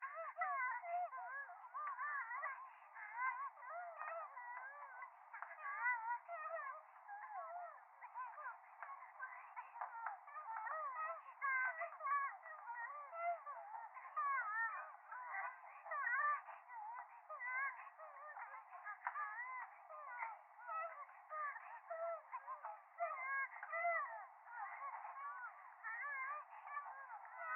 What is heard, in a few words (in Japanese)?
電話 可愛い喘ぎ 電車